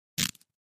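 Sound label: Cloth Split Rip Tear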